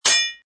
sword clank; Casanova

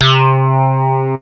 micro bas; synths

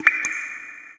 DRIP WAT; Forsaken

